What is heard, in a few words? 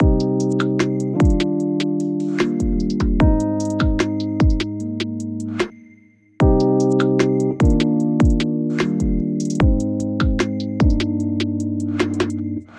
I Love This Loop